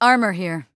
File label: Armor; Hunter; Realm Royale